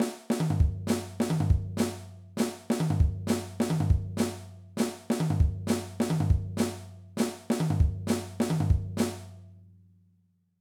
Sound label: Flam Drum Fill